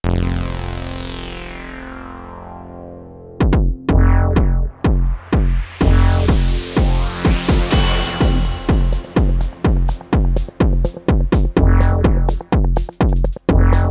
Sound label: different envelope curves